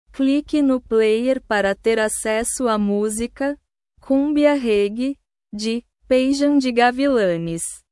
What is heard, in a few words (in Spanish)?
cumbia reggae